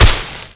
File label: plant breaks